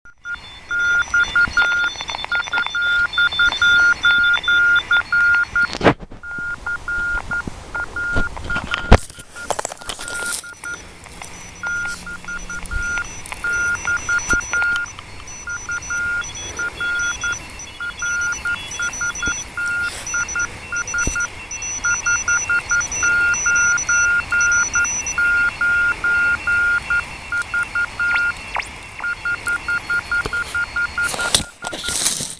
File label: QSO Forest